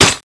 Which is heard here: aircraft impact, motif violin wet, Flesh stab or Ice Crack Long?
Flesh stab